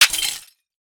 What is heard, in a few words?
wire fence hit